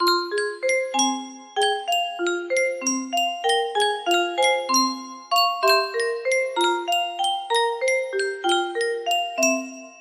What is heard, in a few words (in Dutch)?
Yunsheng muziekdoosje